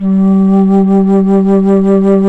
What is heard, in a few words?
FLT, Bass Flute, BsFlt vib; Roland L-CDX-03 Disk 1